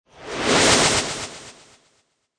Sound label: Magical Whoosh Intense Delay Pan High; Magic sound of casting spell, conjuring or wizardry. Witchcraft ritual summo